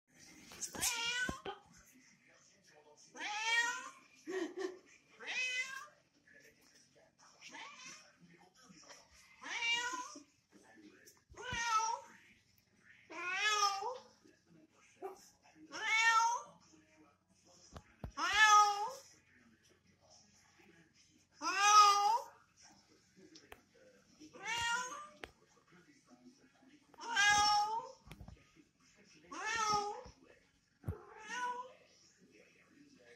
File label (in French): Quand un chat appelle un autre chat